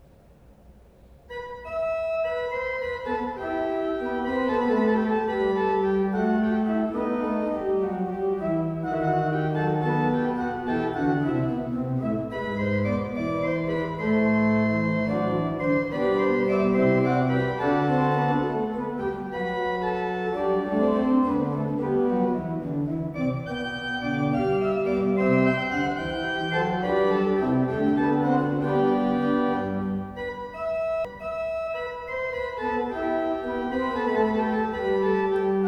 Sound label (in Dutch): Gigue uit de Suite in e-moll, orgels; handel